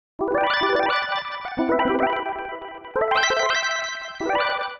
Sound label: Smooth It Out Lead E Minor 90bpm; MIDI Clips; Melodic; Ableton; Factory Packs; Chop and Swing